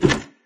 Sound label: drop furniture medium; There; ThereClient; gamekit